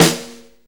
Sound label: crunchy steel snare drum a sharp key